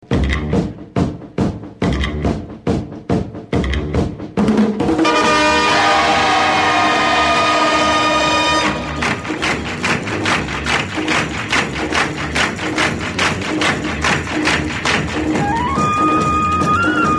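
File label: Instrumental; Indian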